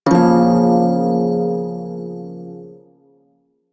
Prime Shawzin Hirajoshi Chord E; Music; Warframe

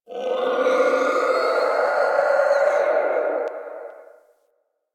Landscape Distant Creatures, Ambience

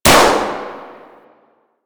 Balloon Pop; Warframe; Ambience; Nineteen Ninety Nine

